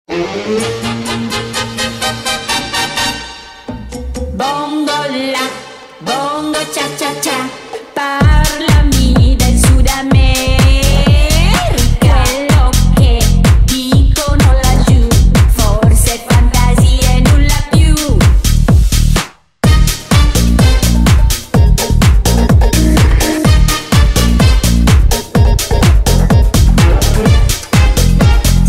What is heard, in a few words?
Routine Set MUSIC; artistic swimming